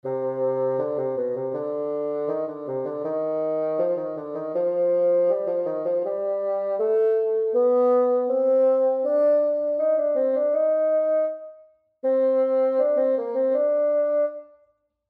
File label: Bass Clef Example